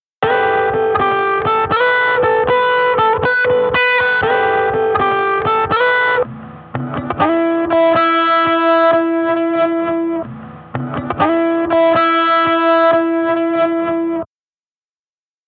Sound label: Am120 2x GTRs impro